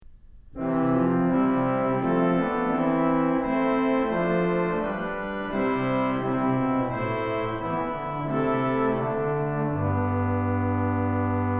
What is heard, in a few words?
Tuba, organ stops; Kellogg Auditorium